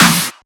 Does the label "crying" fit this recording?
No